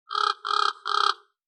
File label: crow cawing; soundscrate